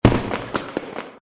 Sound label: bomb hit flowers; gardenbloom